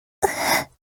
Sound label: scared breathing